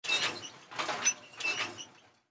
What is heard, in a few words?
cogside step